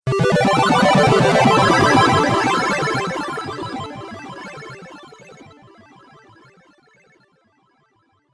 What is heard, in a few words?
Game 8 Bit Ambience, Video Game Sound Effects